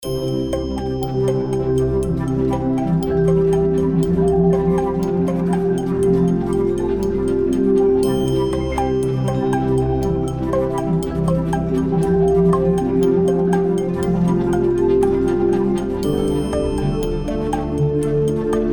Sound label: space music